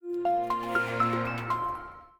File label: Power On; Samsung Z1; keysound